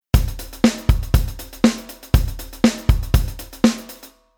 Rhythm 16 beat Basic

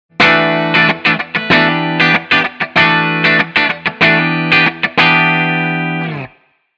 guitar comp

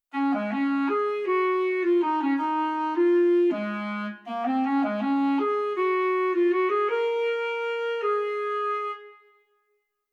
breath

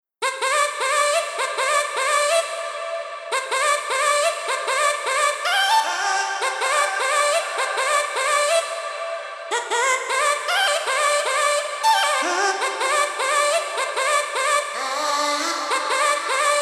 Future Bass Melo Vo Chop Reverb EQ Off

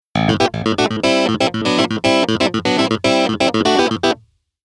Keyboard Clavi Preset

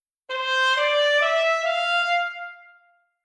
legato

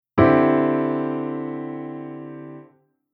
Chord Hybrid; Jissen

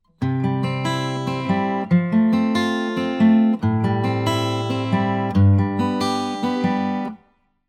guitar Arpeggio

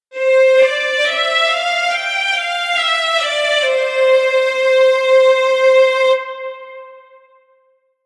Strings

